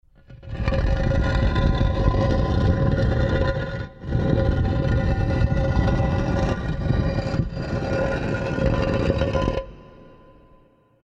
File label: Stone Sliding Door